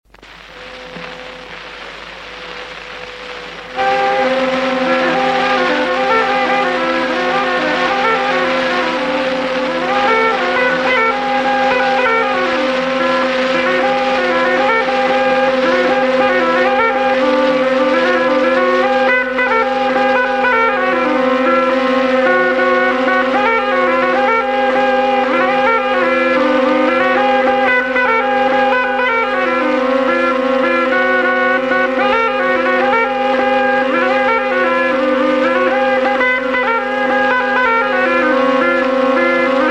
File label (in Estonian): Polka, torupill; Mustjala